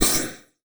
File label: Multimedia Internet CD-Rom Flash Blast